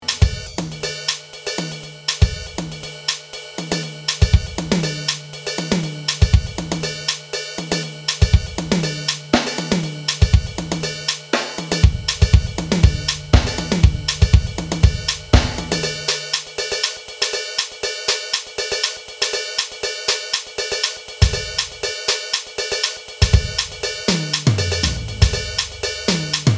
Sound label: bass drum